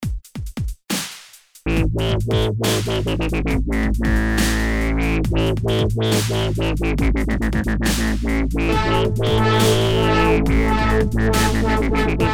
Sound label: dubstep